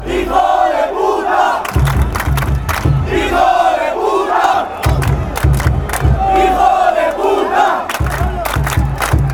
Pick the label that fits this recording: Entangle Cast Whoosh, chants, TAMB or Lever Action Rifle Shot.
chants